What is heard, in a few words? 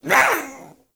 Pet Speak; Enemies; Warframe; Catbrow; PetShip